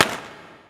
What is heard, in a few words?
firecrackers; ambient; newyear; dota